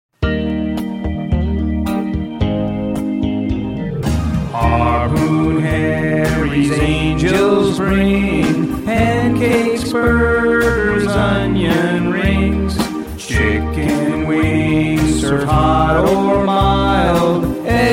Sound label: Christmas Jingle